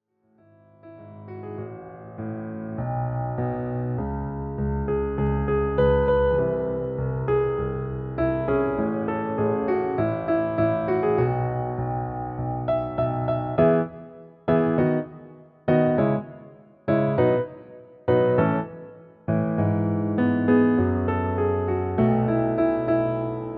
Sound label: Piano